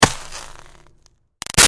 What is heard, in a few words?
Snow Impact Gritty Creak; Weather